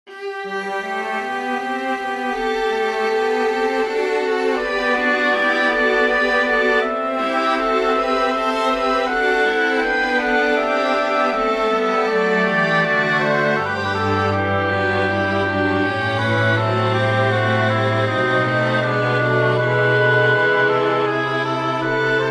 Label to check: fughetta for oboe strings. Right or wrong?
Right